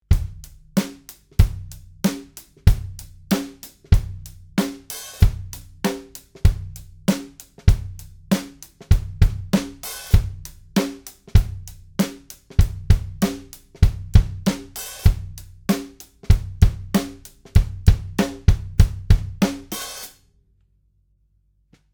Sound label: Rock Drum TG500